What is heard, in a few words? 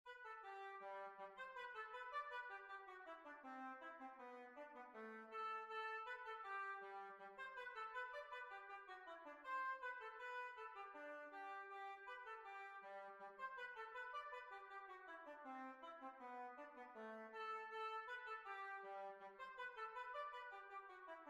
Hornpipe; Sadlers Wells Hornpipe